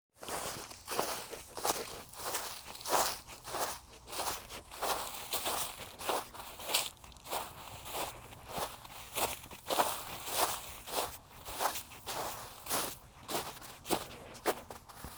walking in the snow

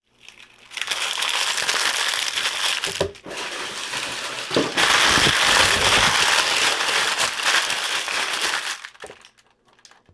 crinkling wrapping paper

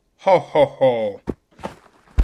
ho ho ho

